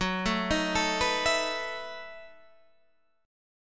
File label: E Major 7th Suspended 2nd Suspended 4th Gb